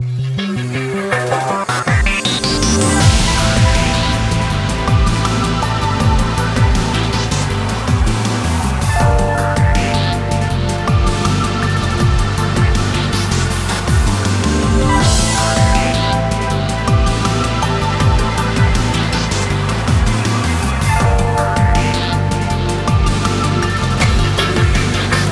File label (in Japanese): フィールド／迷宮; rance x showdown windows gamerip 2018